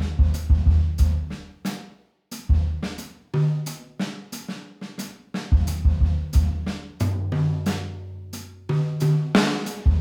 drums

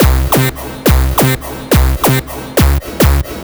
Bounce, hard style

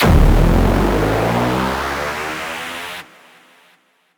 Engine, Downbeat

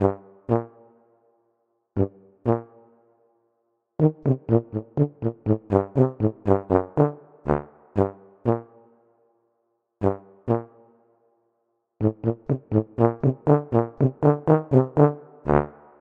Trombone, 120bpm

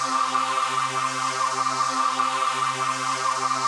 Electrovox, евродэнс

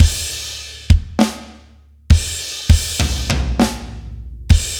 Break Drums, metal; Метал

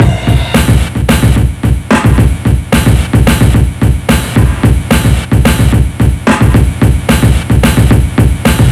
Night Rider B, electronica

